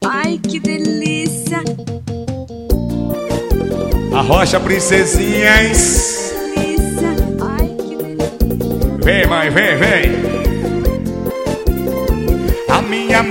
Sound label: brega